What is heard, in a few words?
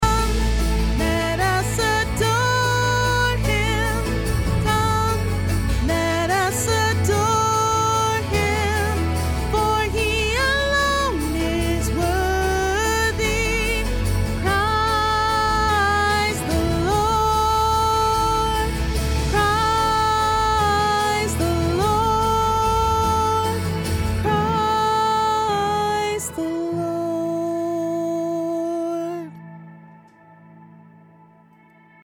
Soprano; Christmas 2025; Soprano Parts